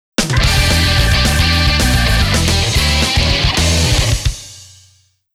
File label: After Goal; Auto Modellista